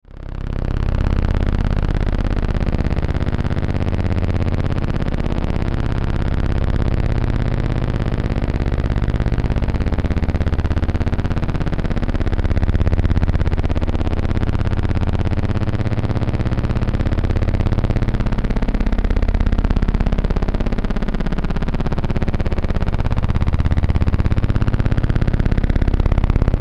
Bed Chopper Distortion Phase, Bed Synth Sequence Pan Sweeper; Bed Sound Effects